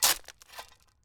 Lopate

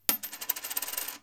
Moneta, coin

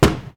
Trzepak, impact

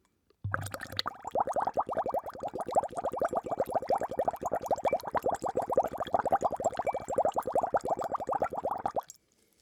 Babelki Bulkanie, bubbles bubbling

